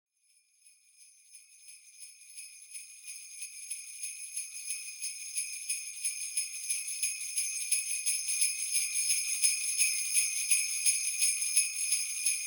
jingle bells; War Thunder

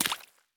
run puddle; Escape from Tarkov

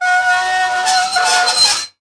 metal squeak; World of Tanks Blitz

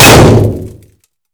Vehicle Weapons

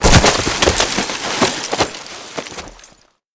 cliff player bigjump; Call of Duty Modern Warfare

